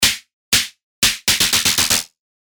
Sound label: Snare; CHIPTUNE; Sylenth1